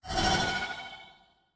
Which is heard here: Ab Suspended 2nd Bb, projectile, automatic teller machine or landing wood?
projectile